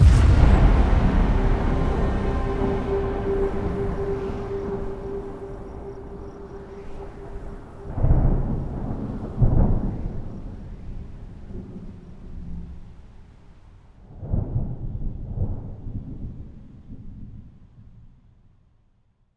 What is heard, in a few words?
splash Screen Feature Splash Animation Start; conan